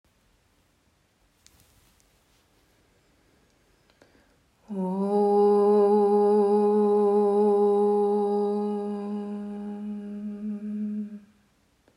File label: Opening Chant